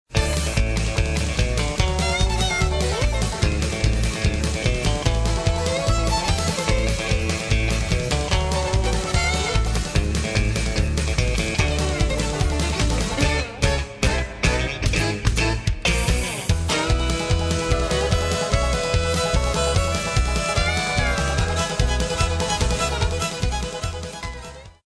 country

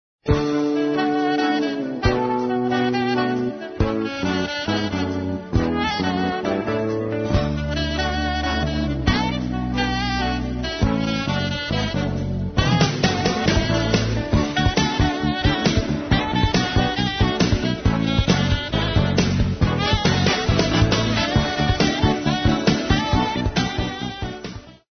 jazz pop

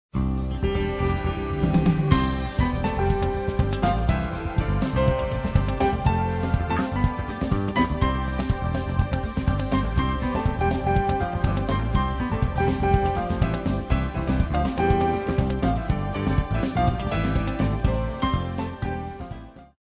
Jazz Joy to World

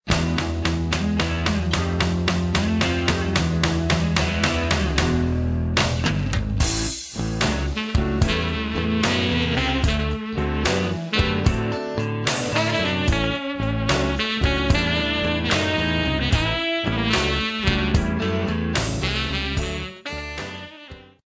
blues

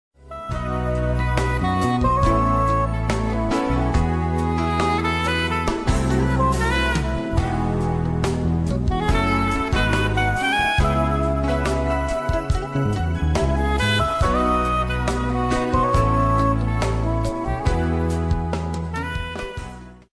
jazz smooth

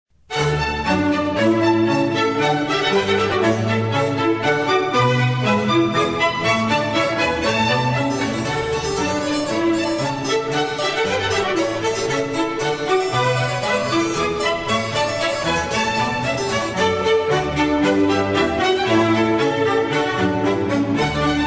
Concerto grosso; Handel

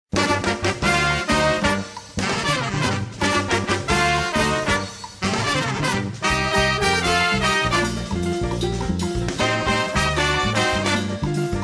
tropical

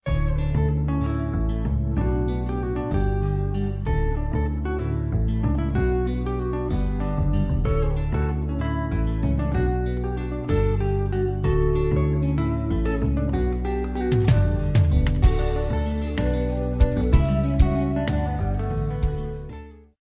ez pop

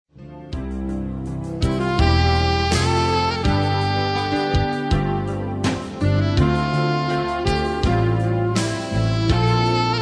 jazz smooth

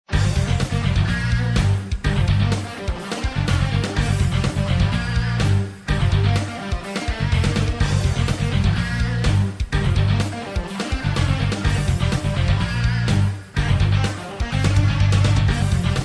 rock heavy

